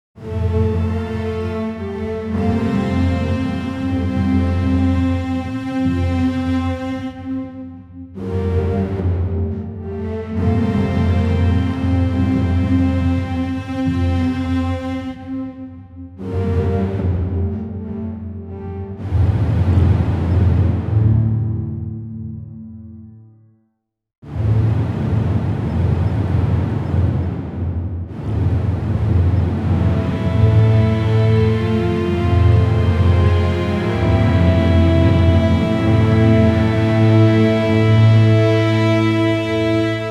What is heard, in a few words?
rising tension strings; african